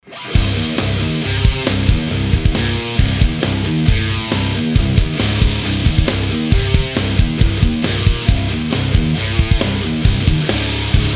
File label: preamp test engl